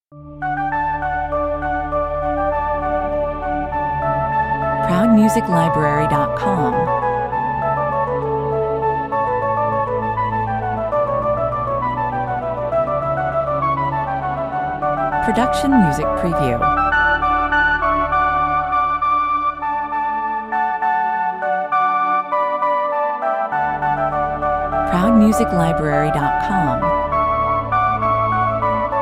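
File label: Christmas chorale prelude, 100 bpm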